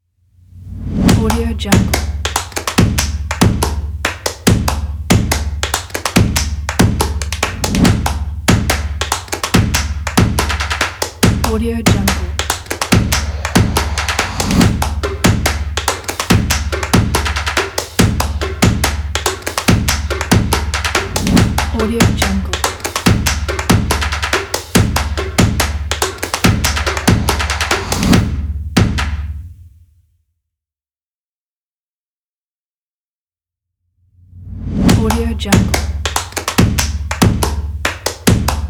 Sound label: stomps and claps short opener